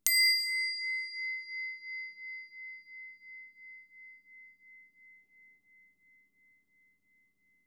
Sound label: smallbowl int loud sound